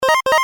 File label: Notification